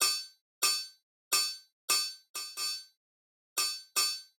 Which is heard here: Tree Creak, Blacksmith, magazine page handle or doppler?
Blacksmith